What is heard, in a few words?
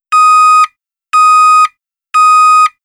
Reverse; SIMUZENSOUND